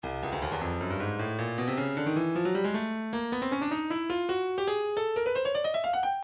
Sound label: breakbeat midi files 140 170 bpm 155; Stylus; spasm